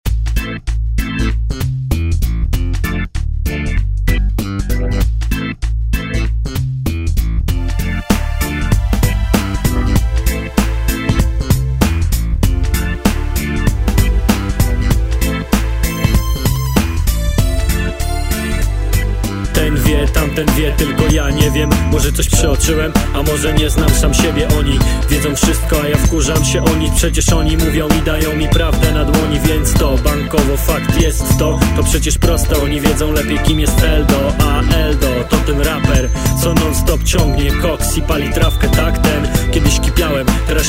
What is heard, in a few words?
reggae remix